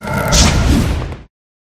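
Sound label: Dragon Skeleton attack up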